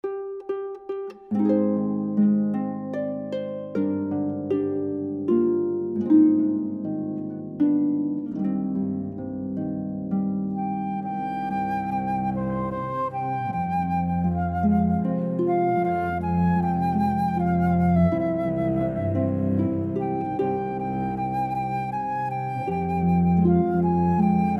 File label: traditional, harp flute cello